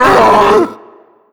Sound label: pain, zombie vox; creatures